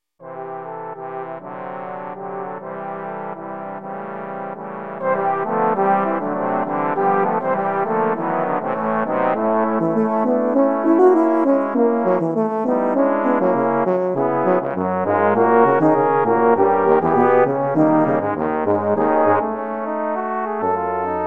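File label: Bulgarian Rhythm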